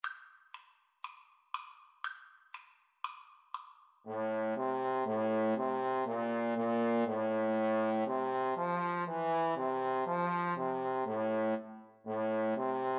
old macdonald, Trombone Duet

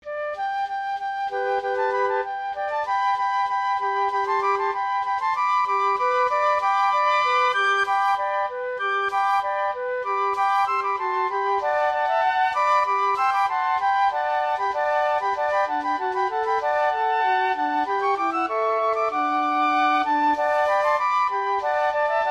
rejouissance, flute trio

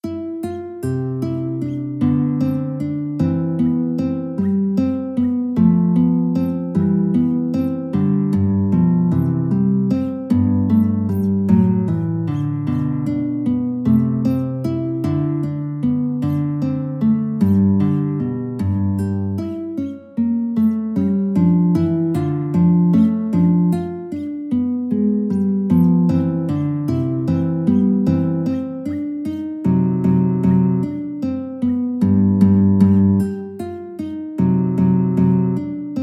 Grazioso, guitar

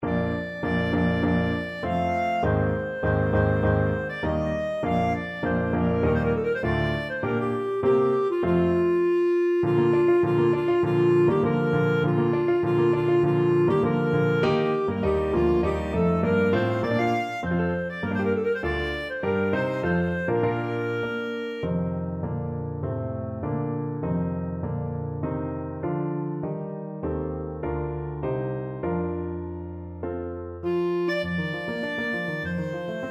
bruch scottish fantasy clarinet

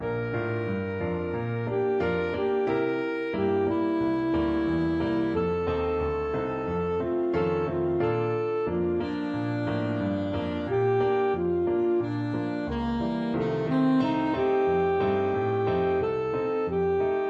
saxophone

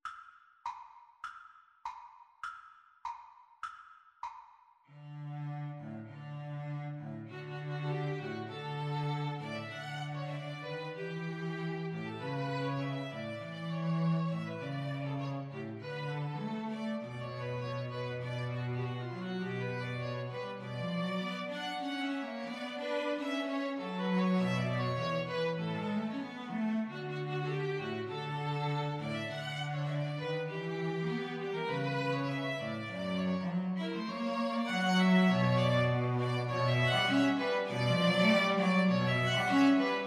string trio

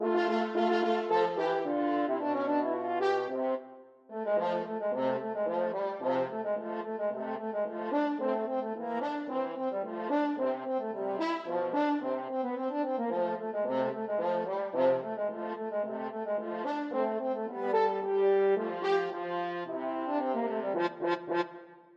french horn duet

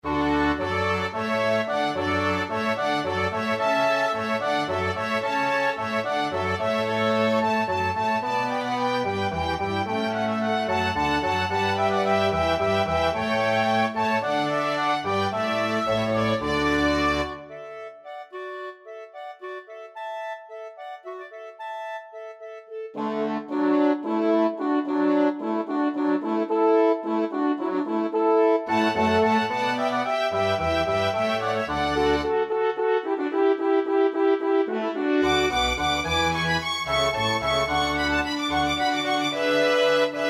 water music, orchestra

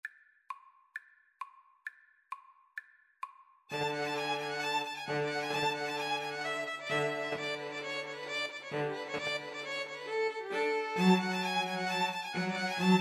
romanian folkdance, string trio

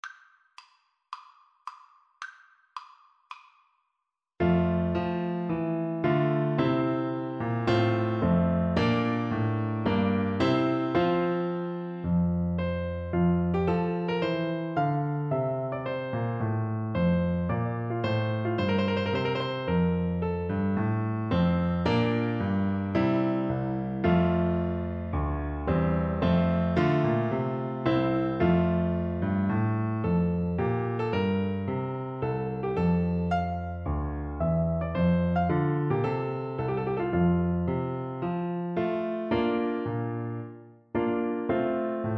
greene trumpet

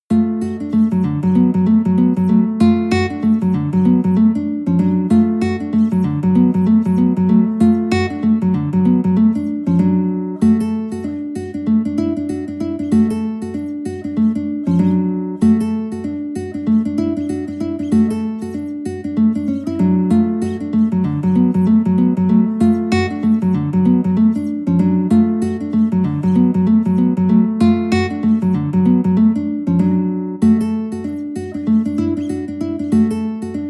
chicken reel guitar

